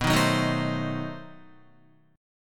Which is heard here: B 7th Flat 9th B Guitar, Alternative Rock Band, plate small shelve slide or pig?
B 7th Flat 9th B Guitar